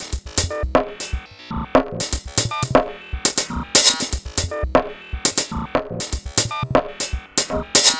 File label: Percussive